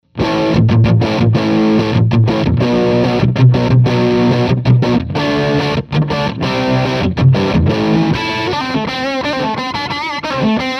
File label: low end crunch